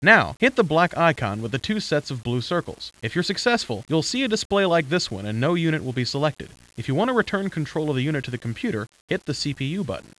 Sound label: HARP